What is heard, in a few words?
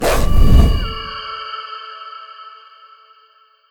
Elementaliste Wolf; Legend of Keepers